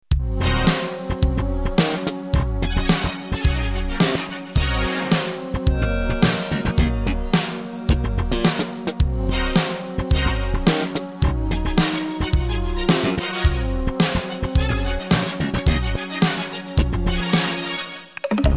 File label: Ensoniq VFX SD; Bleach Out